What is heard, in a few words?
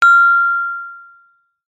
musical instrument gender small gamelan single mallet hit high